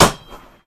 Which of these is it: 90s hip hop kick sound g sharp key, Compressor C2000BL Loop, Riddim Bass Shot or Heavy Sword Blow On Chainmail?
Heavy Sword Blow On Chainmail